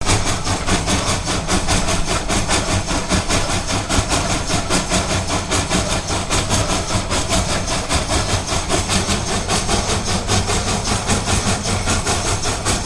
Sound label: Steam Loco Daylight, steam train